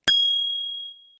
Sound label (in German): Fahrradklingel Tinitus